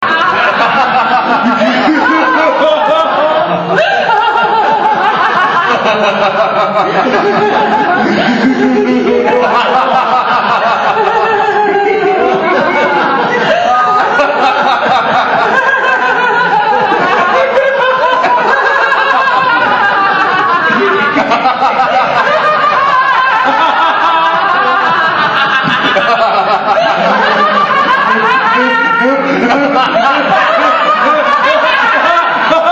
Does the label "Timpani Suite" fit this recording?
No